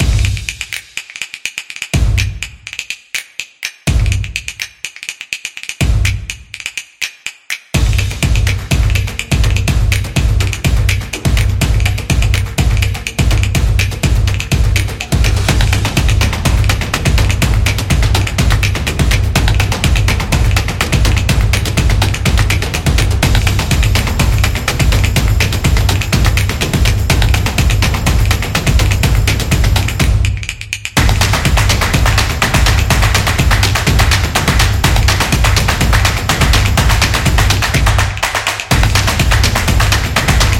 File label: Percussion Energy, stomps and claps